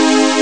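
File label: G# Major; rare gems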